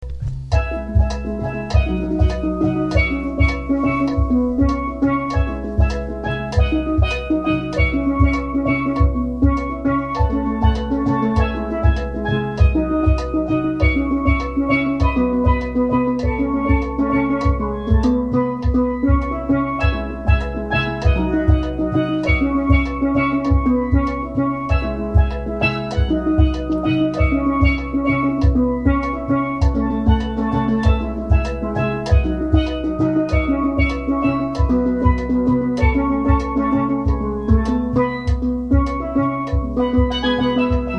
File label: Oil Drums